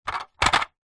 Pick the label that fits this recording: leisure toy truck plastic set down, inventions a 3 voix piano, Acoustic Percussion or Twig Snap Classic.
leisure toy truck plastic set down